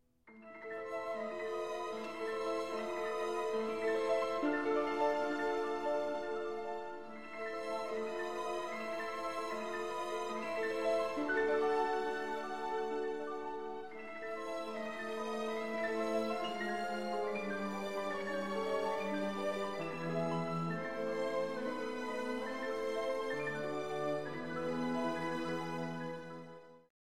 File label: fish; AnimalAntics